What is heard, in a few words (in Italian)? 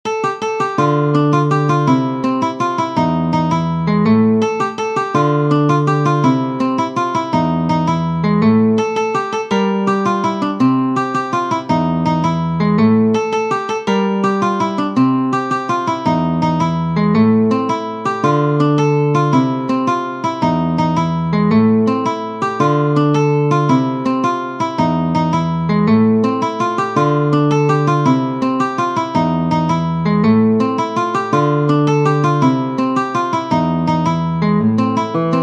Tarantella; Ferdinando Carulli 1770 - 1841 op.72